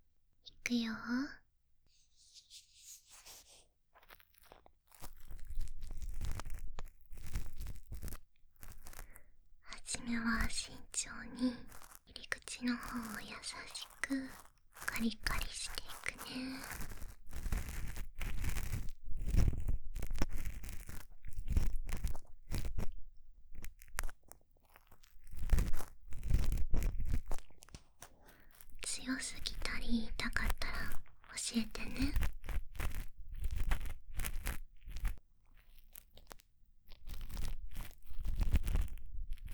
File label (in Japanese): 耳かき; 彼女が寝かしつけてくれるASMR～耳かき、添い寝、心音～